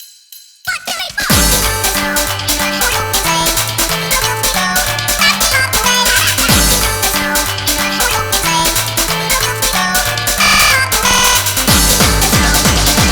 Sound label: Jingle Swing